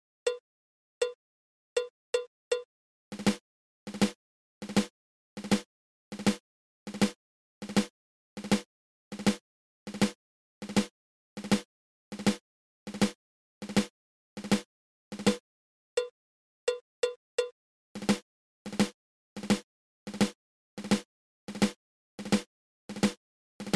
Drag

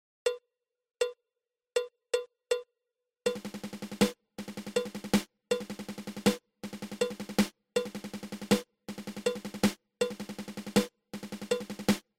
Nine Stroke Roll

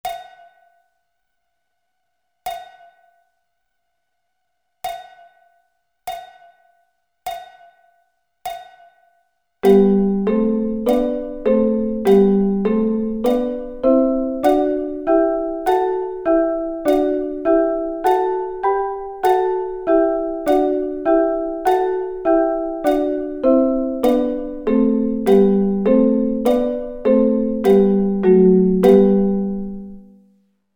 G Double Stops

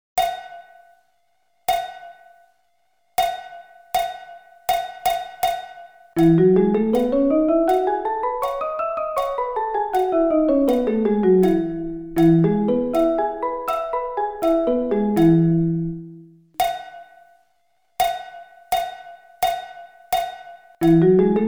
E Scale

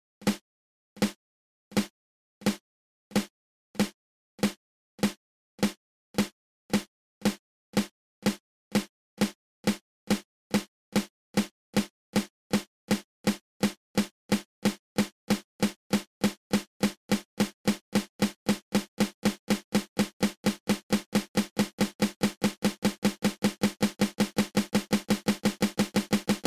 Flam open close open

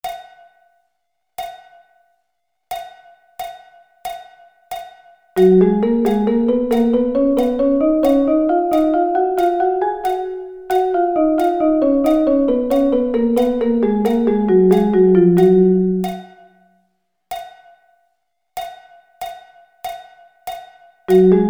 Double Sticking

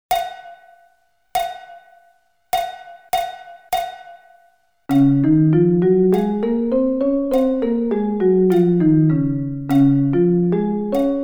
Db Scale

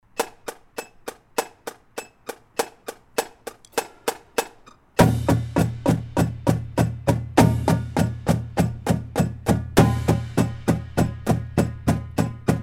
Accent Tap

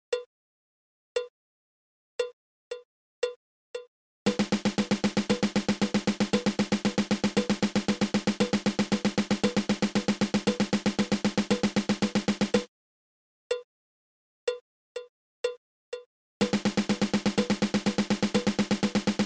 Single Stroke Roll

